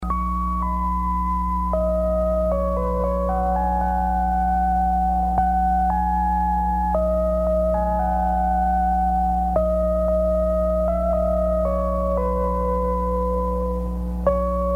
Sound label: music